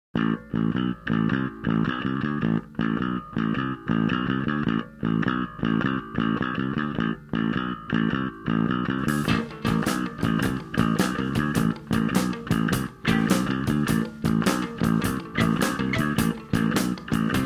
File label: acid house; eraser gun